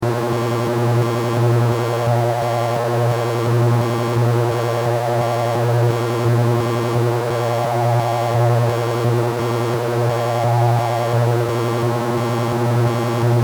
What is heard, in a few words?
Rrrrrooooooooowwwwwwwrrrrrrruuuuuu; analog synth